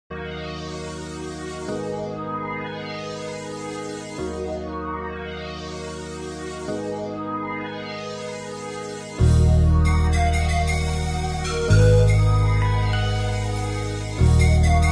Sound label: brass minus